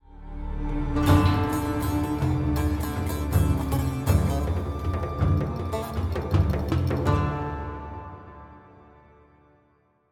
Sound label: Eastern Death Stinger Short